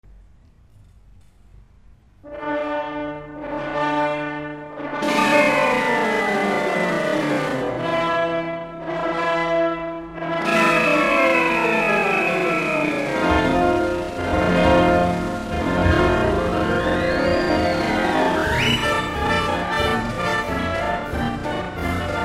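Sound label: Circus March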